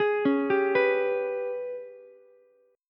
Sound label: Ab Minor Major 7th Double Flat 5th Ab, Ukulele; arpeggiated; JGuitar